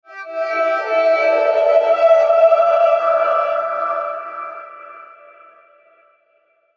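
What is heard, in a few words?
Musical Element, Drum Snare March; Blastwave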